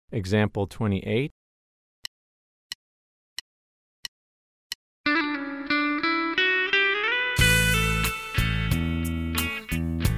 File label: Country Rock